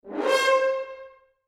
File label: rip